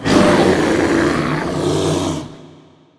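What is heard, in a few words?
Bear Attack Vox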